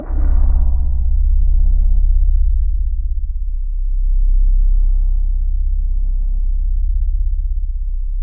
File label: C1, Synth Leads; 90 s Sample CDs; NiceBeats (NiceBytes); Studioline 09